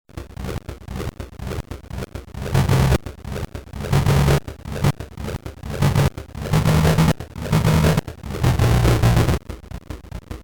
rumble barn piggy

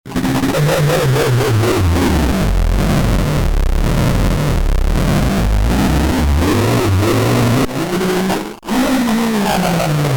rumble barn buttons

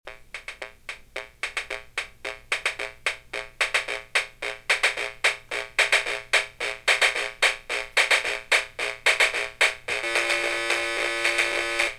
go sound

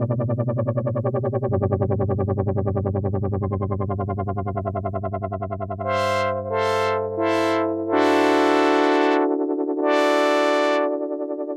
crumar brass

